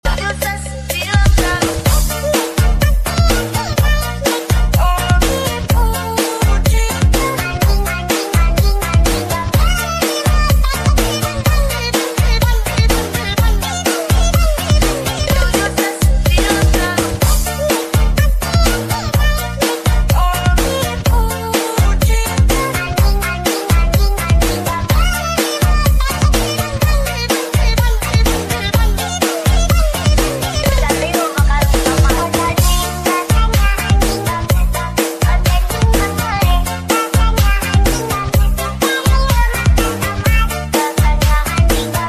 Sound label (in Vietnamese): Nhac Chuong Remix